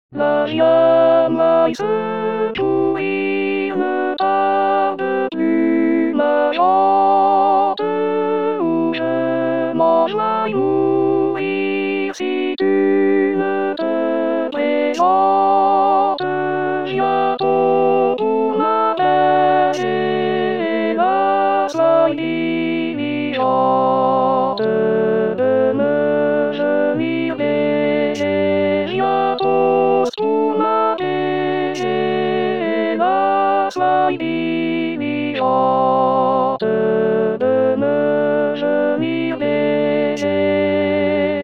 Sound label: alto; rimailleurs